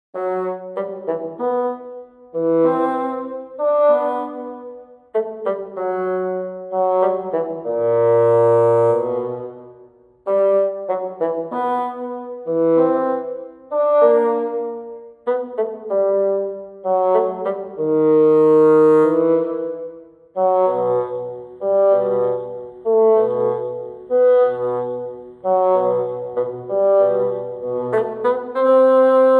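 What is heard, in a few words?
Sneaky Steps, Animals production music; tiere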